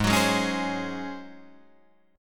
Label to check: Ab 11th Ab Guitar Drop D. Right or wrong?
Right